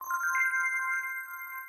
lens flare unlock, ui; Samsung Galaxy S4 Mini Black Edition

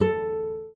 Classic Guitar

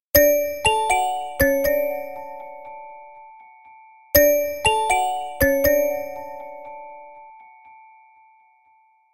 Elegant Simple, ringtones

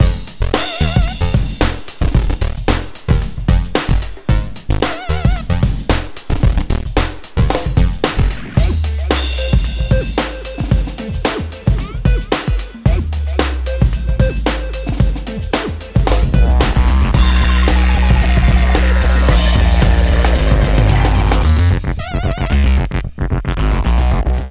Future Funk, ringtones; Motorola Moto C XT1756

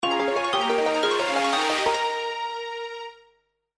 Blue Alarm; Sony Ericsson; P900

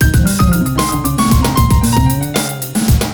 Jazzy; Google; NewWave Labs

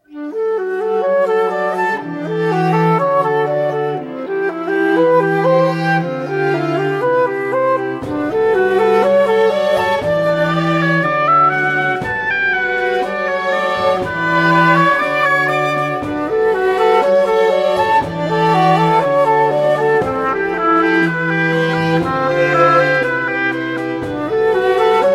Sea, ringtones; LG; Wine Smart

